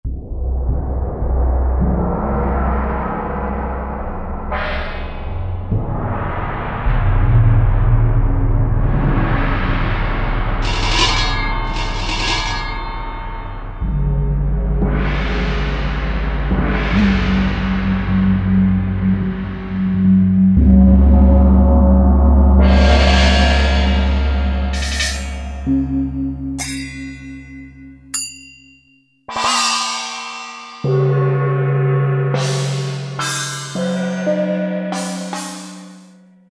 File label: Gongs Dry; Roland L-CDX-04 Strings And Orchestral Percussion